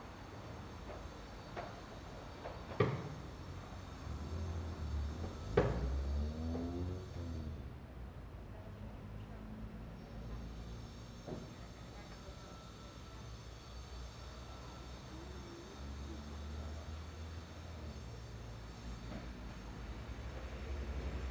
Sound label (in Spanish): AMB EXT MANANA CIUDAD Prado UY Generico transito